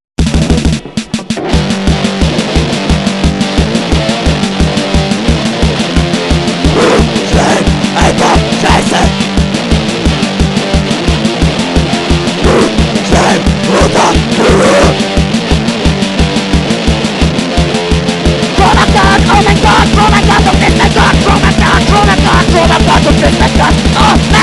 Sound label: violins; Song